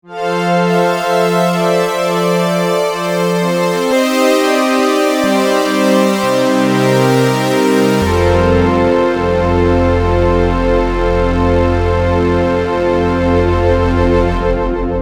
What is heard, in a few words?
X-Strings, Prophet '08